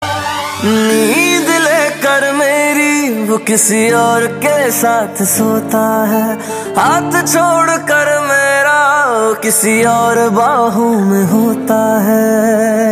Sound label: Ringtone